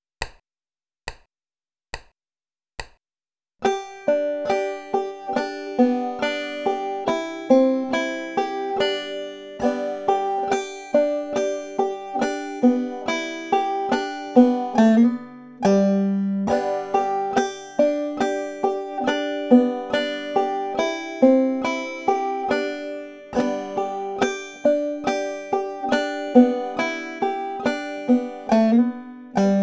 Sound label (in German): hammer on pull off slide und drop thumbing; cripple creek